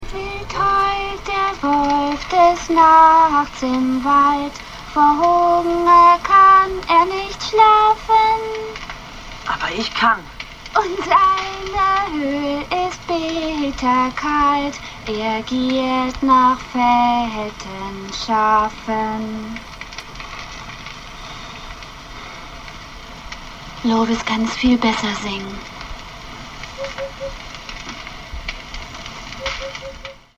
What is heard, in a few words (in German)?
Wolfs Lied singt; Ronja Raeuber Tochter